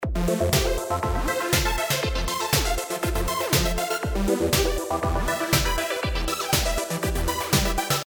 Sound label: e snare